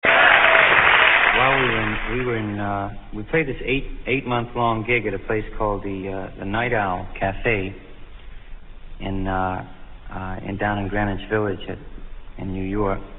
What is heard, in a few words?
steam